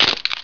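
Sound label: arrow break; HEXEN2; assassin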